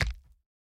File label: Bounce Bone